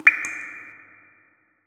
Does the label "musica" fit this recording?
No